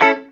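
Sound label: guitar chord A; Sounds Good - Roots Reggae; GTR CHD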